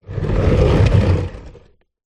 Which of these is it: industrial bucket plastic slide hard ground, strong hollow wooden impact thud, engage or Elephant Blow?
industrial bucket plastic slide hard ground